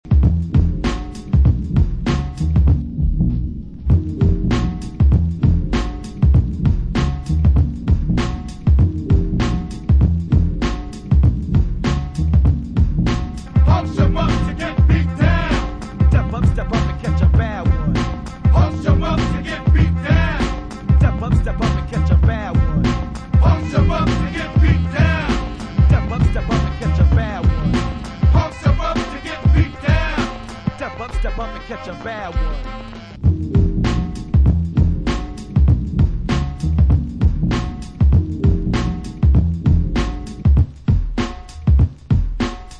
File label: remix instrumental